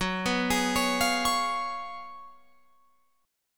Gb Major 7th Suspended 2nd Suspended 4th, Guitar Open F